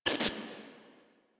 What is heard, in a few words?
Screen Glitch